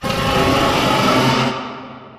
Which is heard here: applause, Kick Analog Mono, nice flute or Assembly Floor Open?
Assembly Floor Open